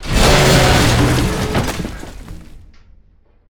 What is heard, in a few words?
Death